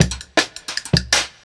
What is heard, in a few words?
Jungle Breaks; Original Jungle Breaks